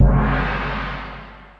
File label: big boomy gong; Gongs and Super Crashes; single hits